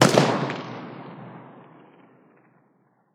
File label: ak47 distant; weapons; Counter Strike Model Pack; CS2